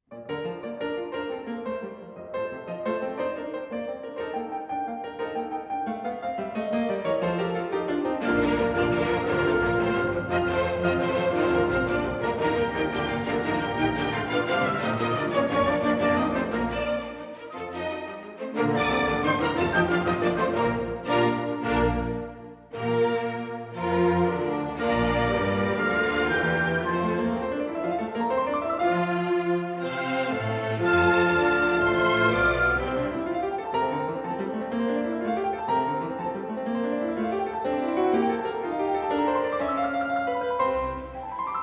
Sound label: Piano Concerto; Beethoven